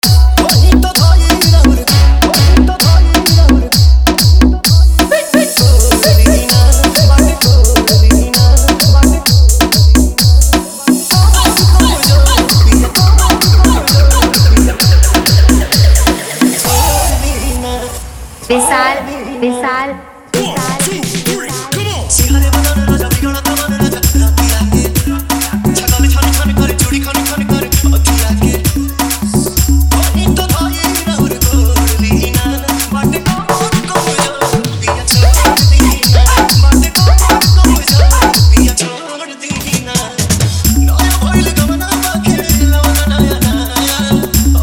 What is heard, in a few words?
Bhojpuri Dj Remix Song